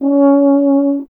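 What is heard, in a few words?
brass French horn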